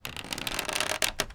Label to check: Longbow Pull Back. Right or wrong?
Right